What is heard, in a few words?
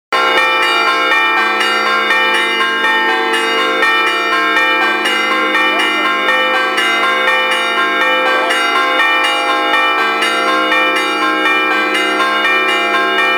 bells, delphi church greece